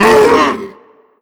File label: pain, zombie vox; creatures